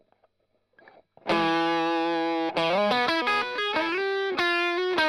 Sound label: Strum Line